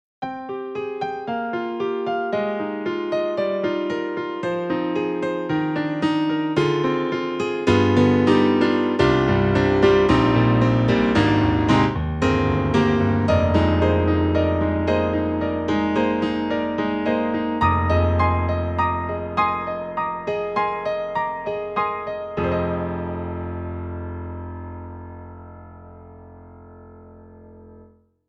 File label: Piano; KORG; KRONOS 2; OASYS Piano